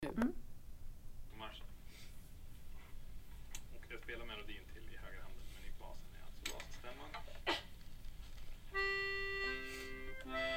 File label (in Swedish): basstämma lyckovalsen